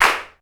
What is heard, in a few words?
XE8 clap; Crypton - DrumBase5000